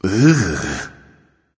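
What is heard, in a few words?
non-verbal ugh; smite; voicepack; houyi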